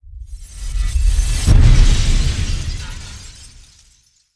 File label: implo explo crystal; astro; Earth and Beyond; EA Games